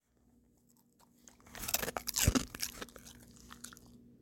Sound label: dog crunch